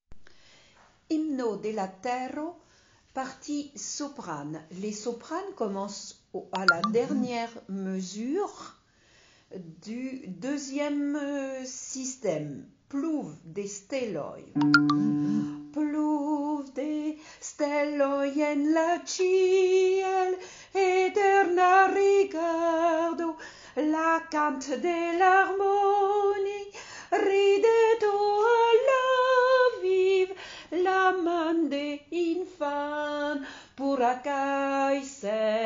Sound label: Sopranes